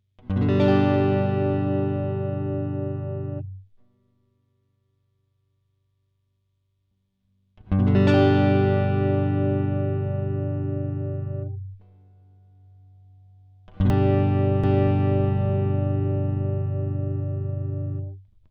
Thump

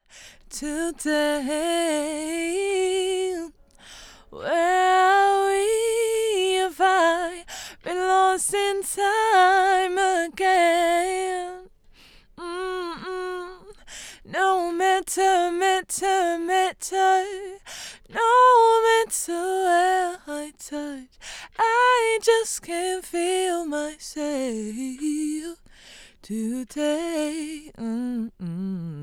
FEMALE VOX

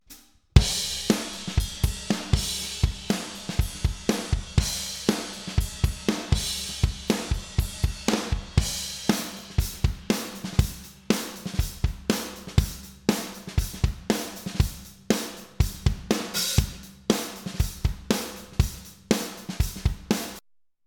drums LA2A

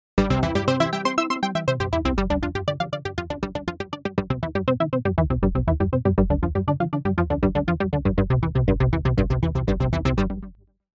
Synthesizer noFX

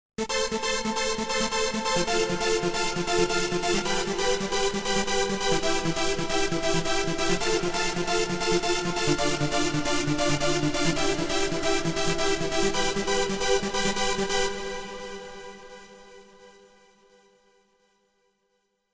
Trance Syn

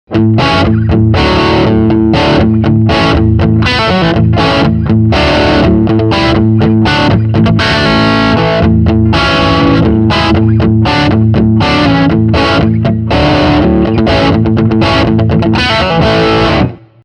mild distortion

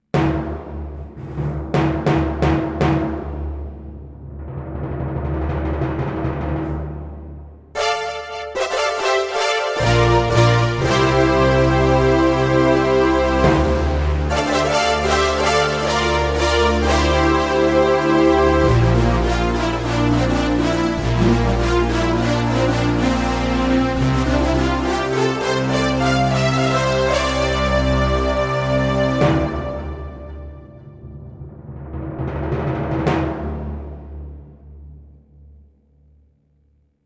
Brasstron

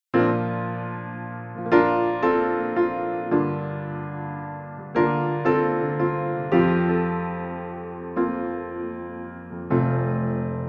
Clavia Nord Grand Bambino Upright

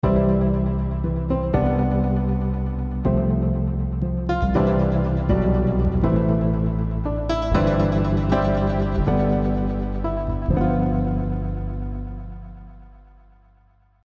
Emotional Piano Dist Slapback Echo; Pigments; COLDFIRE

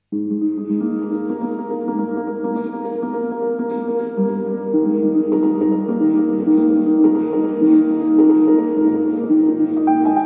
Korg Wavestate MkII Soft Piano Pad; LABS

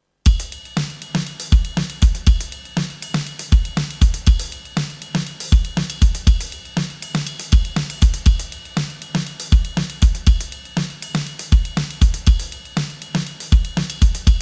Drums Hall Salzburg; VSL MIRPro3D; Karl Boehm

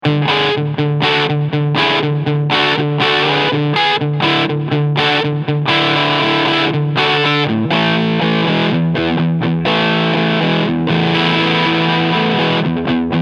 Golden Fleece Clean Channel Full Gain Riffs; Mythos Pedals

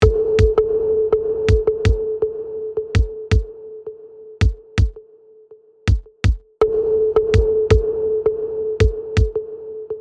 Kick Shifted PING wet

